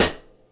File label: Vintage Closed Hi-Hat; Drum n Bass; Hi-Hat One-Shots; KJ Sawka; Drum n Bass & Beyond; Drum Tool Box